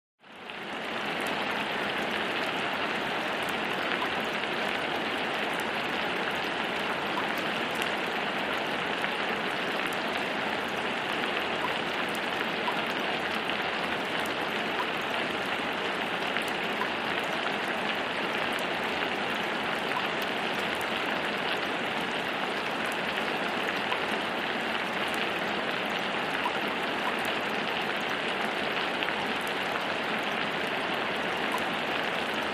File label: Attic Ambiance Rain